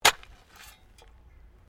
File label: Digging Shovel Mulch; Various digging tools and processes; Construction Sound Effects